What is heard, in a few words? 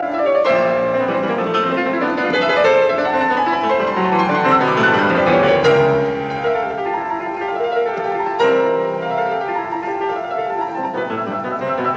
Variations in C major on a Waltz; 33 Variations; Op 120; Allegro